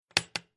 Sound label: Household Old Wooden Writing Cabinet Large Chest Draw Handle Released Two Parts; Tom Hutchings